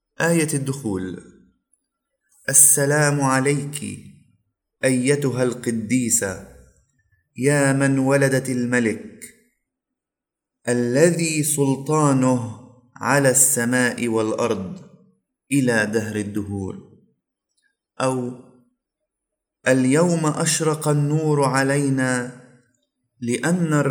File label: Entrance Antiphon; Solemnity of Mary Mother of God